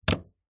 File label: household electrical plug pull remove from wall socket